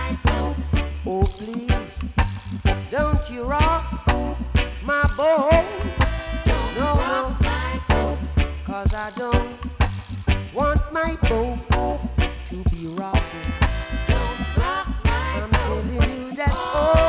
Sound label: reggae